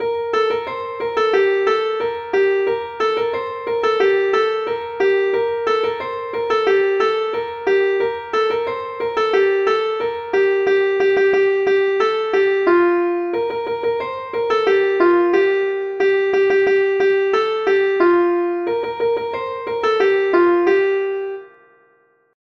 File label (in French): suite ronds